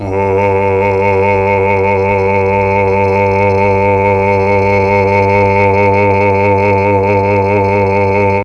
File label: G1 CHAMB MAL, Mellotron, MALE VC; Mike Pinder - Mellotron Archive CD; CHMB MALE VC